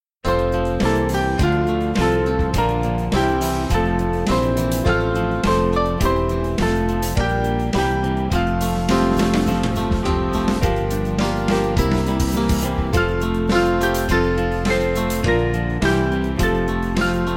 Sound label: O To Fathom, Band